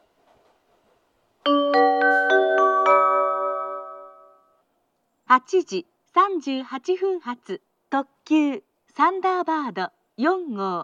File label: limited express, kyoto, station; thunderbird4; osaka